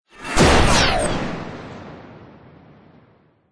science fiction gunshot with electronic charge and discharge; zapsplat